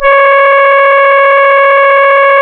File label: C#, Synclav FM, BRASS; Big Fish Audio